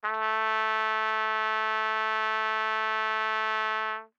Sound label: Trumpet novib ff A3 stereo, Bb Trumpet; MIS Pitches - 2014; Brass